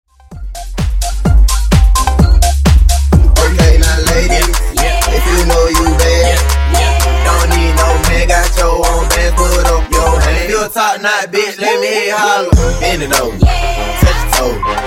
Transition Twerk Dj Intro Outro 96 128; 100Bpm

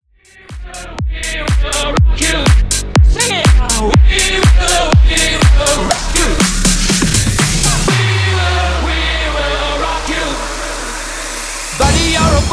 Clean 122bpm

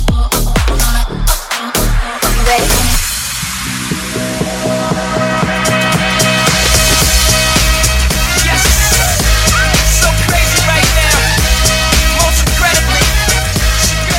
Tech House 126 126bpm Ebm

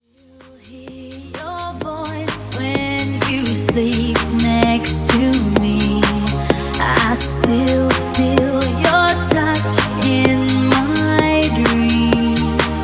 Pop Rework; Remixfreakz

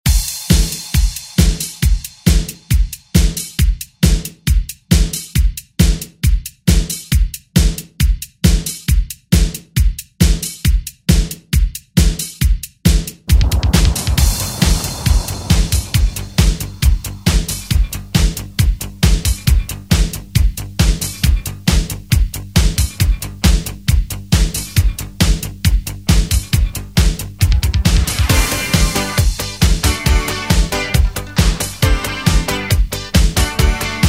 90s ReDrum

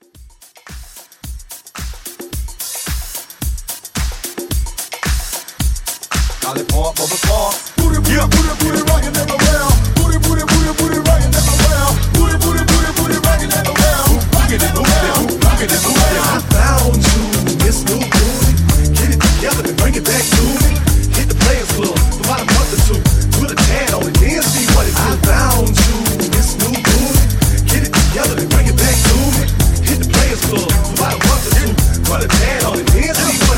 90s Hype Re Drum

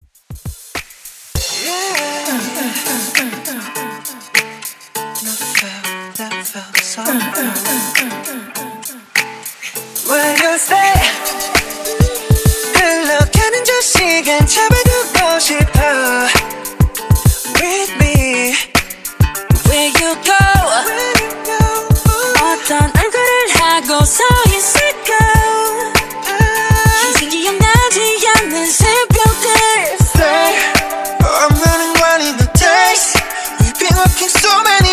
KPop Re Drum 100 BPM; Top40

